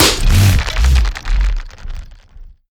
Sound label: Vapor Miner Stalk Hit; moul